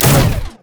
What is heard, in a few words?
rumble shield